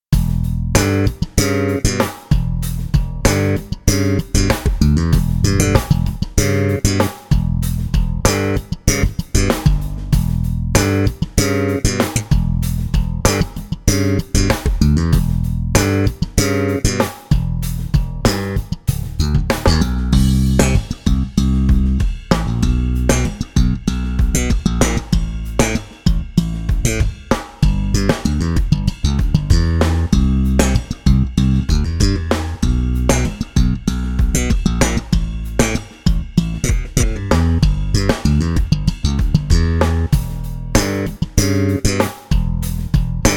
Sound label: corebass cherry slapped